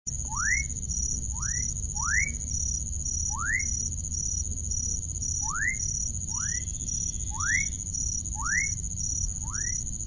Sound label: Elachistocleis ovalis